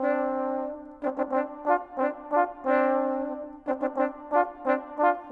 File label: Trombones, Orchestral; Sonic Foundry (Sony Creative Software); Orchestral VOL-2; Modern 25